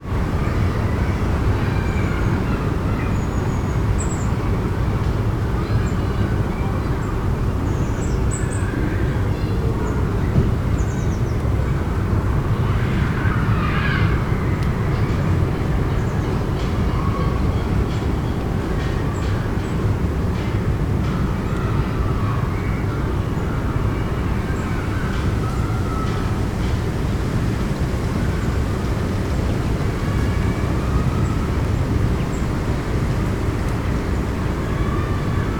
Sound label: ambience public place park school playground children distance autumn wind trees